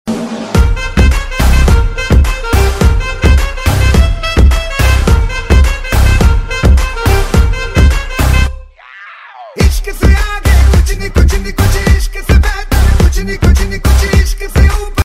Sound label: ringtone